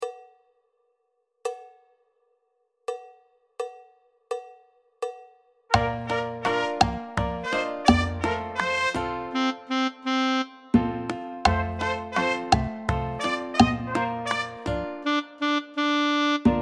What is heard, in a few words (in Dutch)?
cha cha cha Langzaam